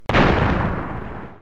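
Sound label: explozion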